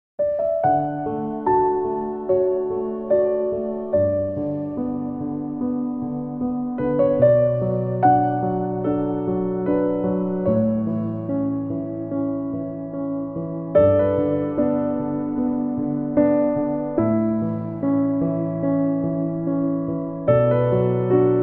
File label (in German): Musik Piano Sound; 4. Advent